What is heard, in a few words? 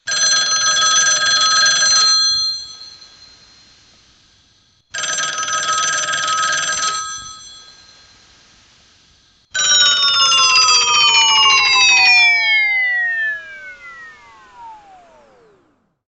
oldschool dying tone